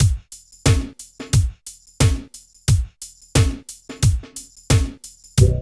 DRUM LP; East West; Phat & Phunky

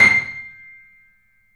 The Piano; InVision Interactive - The Piano

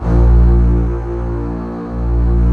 A#1 C-BASS; C-BASS1

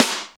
SNR, Tekno Industrial; East West

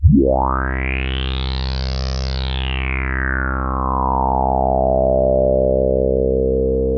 TS10 Analog, Synthesizers

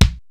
XR-10 K+SN+H, drums; Rubber Chicken Software Sample Bank; DRUMS + PERC

